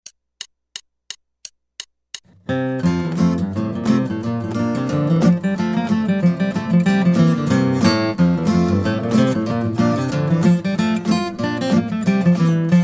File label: Guitar